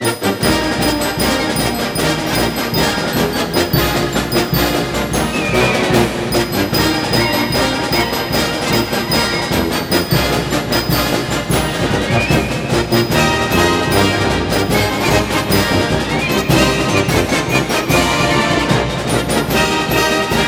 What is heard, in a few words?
Broadcast Fight Song